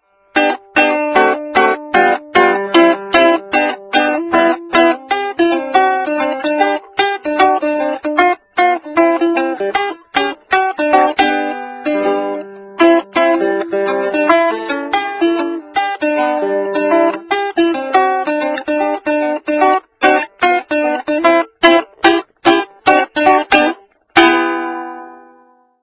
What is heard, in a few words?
Pick Bari